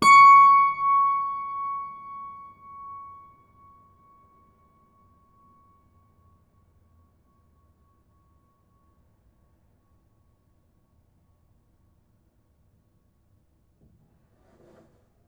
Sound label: String Pluck Ped, piano; Piano Miscellaneous